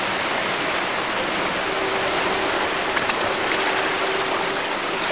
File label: river